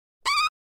Fart Comical High-Pitched Squeak Like Balloon; Human Sounds

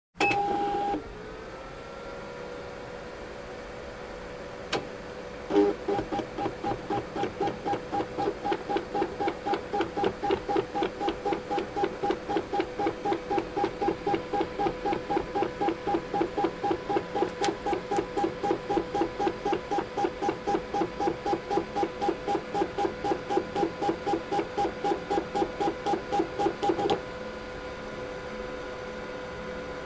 Office Machine Fax Receiving Document; Household

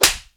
SLAP, FIGHT; 1000fx

